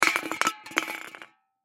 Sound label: Can Drop Aluminum Empty; Soda Pop; Foley; Blastwave